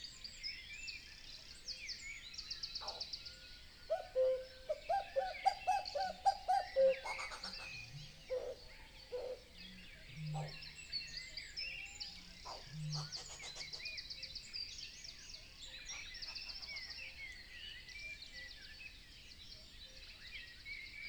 Nature European Wetland Dawn Chorus Cuckoo Stereo Binaural